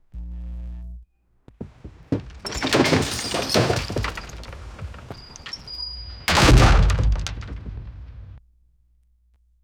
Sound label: wood